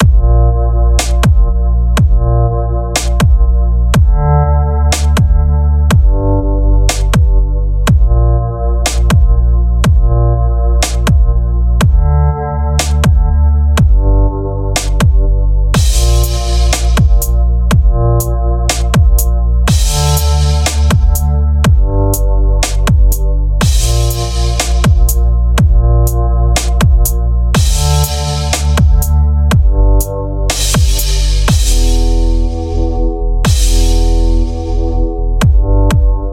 Instrumental; lolicore; J.W.Records

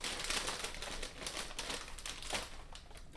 Paper Bag Crinkling; Working With My Grandma 4.28.2016

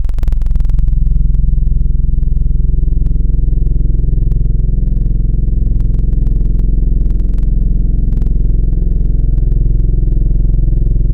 Trumpeter; Instruments; Synthetic's Serum Collection; Echo Sound Works Core Tables

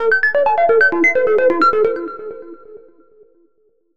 ARP Happy Scene; Synthetic's Serum Collection

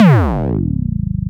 disco tom; ESW Floom V.1

